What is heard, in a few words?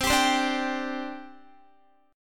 C Suspended 2nd C Guitar; Csus2; strummed